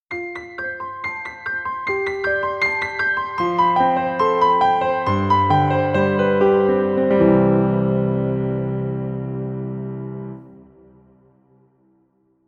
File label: warm piano logo